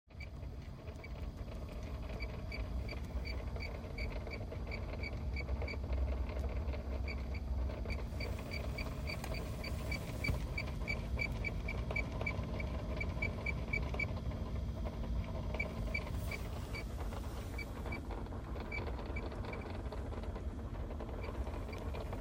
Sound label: blend door actuators noise, Ford Escape Hybrid